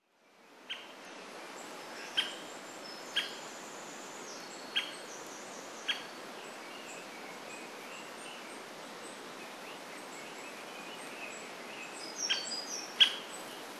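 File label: white-backed woodpecker, shiiba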